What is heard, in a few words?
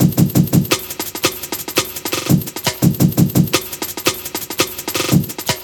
Break, Drum & Bass, Beats & Breaks